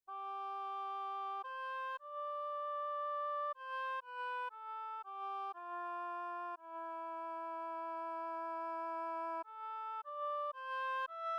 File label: oboe class